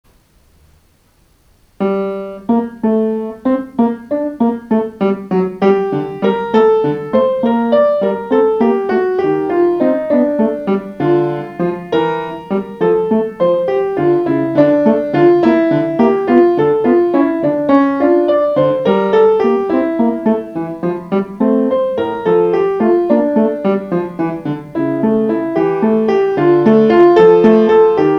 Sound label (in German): Zweistimmige Invention g-moll